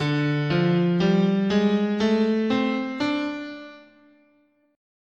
D Blues, scale

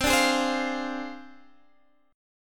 Ab 6th Flat 5th C

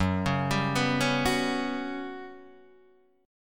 G Major 7th Flat 5th F sharp

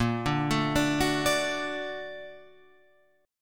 Bb Augmented Major 7th Bb Guitar Open F, chord; Bb+M7 arpeggiated; 10,9,9,9,9,9; JGuitar

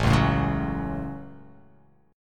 A 6th, guitar

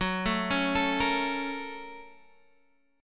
Bb Minor Major 7th Sharp 5th

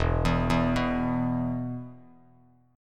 E 5th, Bass Standard; arpeggiated; JGuitar

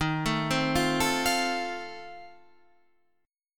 F 7th Eb

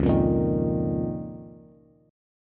Db 6th Add 9th Db down Bass; Db6add9 strummed; JGuitar

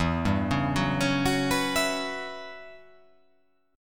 E Minor 6th Add 9th chord

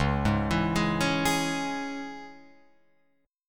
C sharp Minor C sharp Guitar Open F, chord; arpeggiated; JGuitar

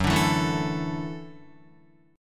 Gb Minor 7th Sharp 5th, Guitar; strummed; JGuitar

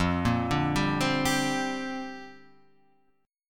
F 6th 9th Suspended 4th F, chord; F694 arpeggiated

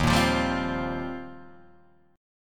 D sharp 6th Add 9th, Guitar, Open D

